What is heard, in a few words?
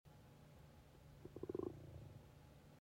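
Gurgling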